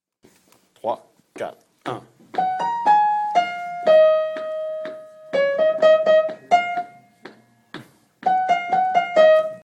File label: piano; Musique Rois 2009